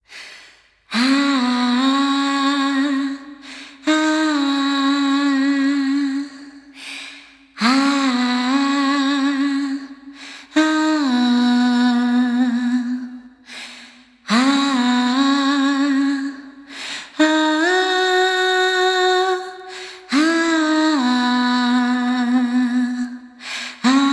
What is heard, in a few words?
song acappella; pizzica